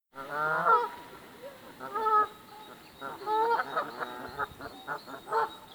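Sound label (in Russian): важный гусь бродит по двору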